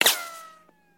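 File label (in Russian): cartoon sound of shot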